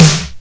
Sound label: short acoustic snare sound e key, Royality free snare tuned to the E note